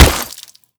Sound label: body; Escape from Tarkov